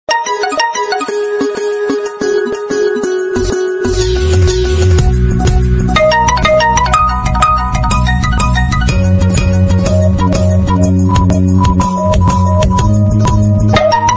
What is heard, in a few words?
vivo x50 pro original